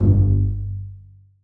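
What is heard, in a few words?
basses pizzicato f#3; Sonatina Symphonic Orchestra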